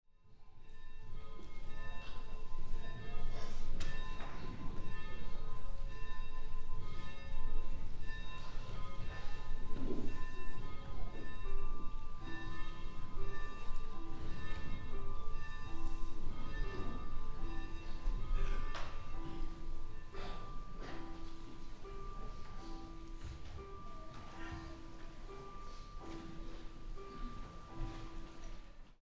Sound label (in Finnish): Kutsukellot